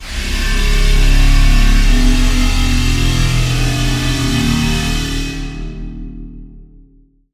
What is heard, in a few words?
Pad; Beast Field; Routed Sound; Serum 2 Expansion - Chaos Bringer Vol.1